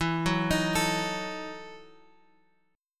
E Major 7th Suspended 2nd Suspended 4th E, Guitar, Open G; chord shape